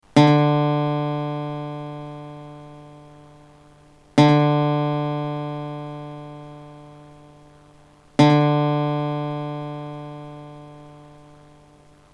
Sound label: D 4th string Guitar